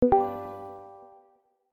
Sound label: discord user join sound winter